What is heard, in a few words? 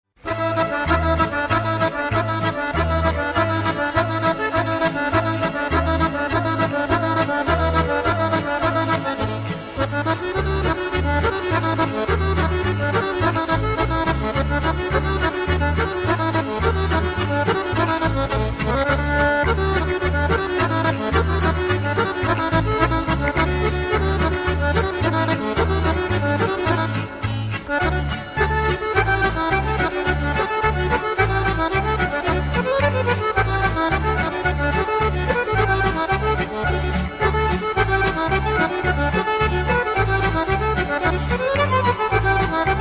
serb music